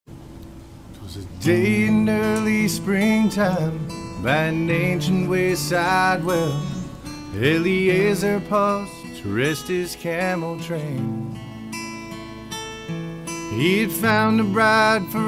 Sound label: banjo